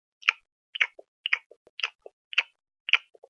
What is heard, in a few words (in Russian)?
zvuk chavkanja